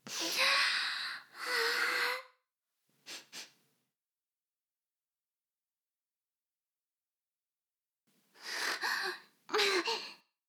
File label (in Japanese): 耳舐め音声; 牧場戦腺; 少佐殿